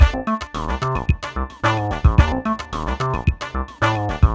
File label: xtra Euro gruv; Sonic Foundry (Sony Creative Software); Ugly Remnants VOL-2